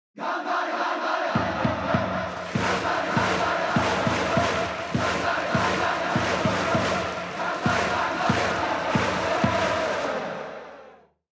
Music Ouen Event; ebaseball powerful pro yakyuu 2020 ps4 switch gamerip 2020

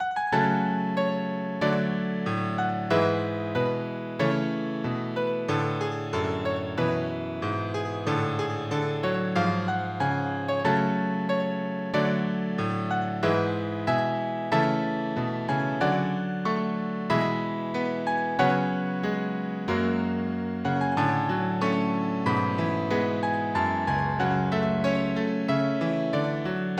Game Over piano; One Way Heroics Plus; soleil-musique

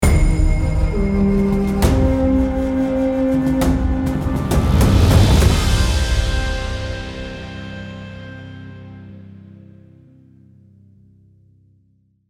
Bandit Vignette Win

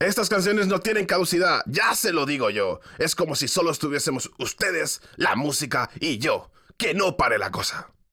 Music Vehicle Radio; Jurassic World Evolution

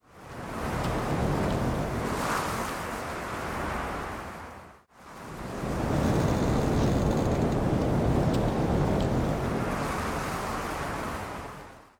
wind; Alien Shooter 2 New Era

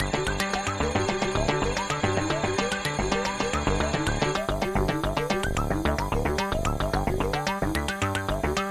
Cartoon

